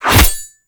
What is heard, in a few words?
Machete Whoosh; Warframe; Weapons; Melee; KahlMachete